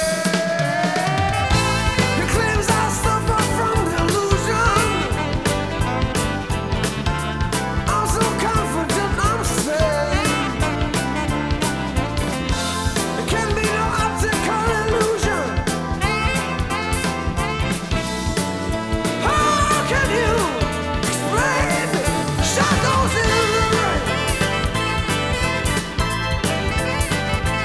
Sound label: rock